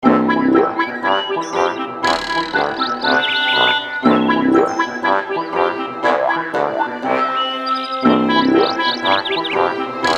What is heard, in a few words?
Level Theme (Woods)